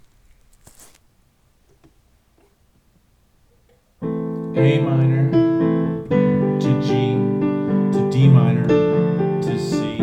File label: Piano Thang